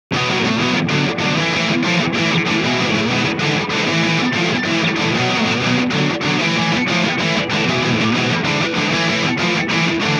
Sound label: guitar intro